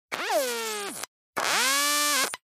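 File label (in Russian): скрипучий шум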